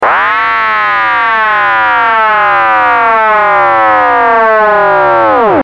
LFO Impact; FX; Titan; LFO Impact 03

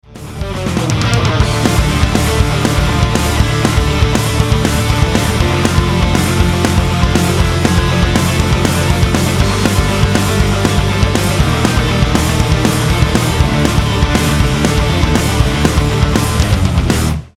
modal interchange

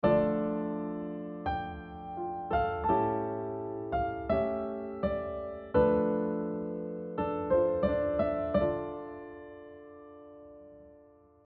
D Dorian secondary dominant; modal harmony

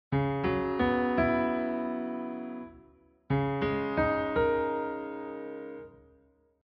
quartal and quintal

